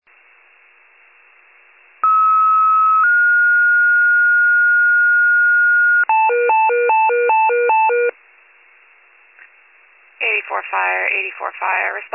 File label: FIRE pager alert